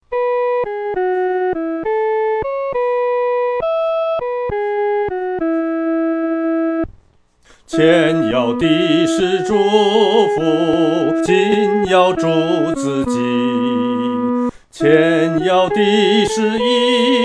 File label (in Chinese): 独唱 第一声